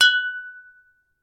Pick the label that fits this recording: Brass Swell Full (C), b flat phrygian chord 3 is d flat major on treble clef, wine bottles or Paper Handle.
wine bottles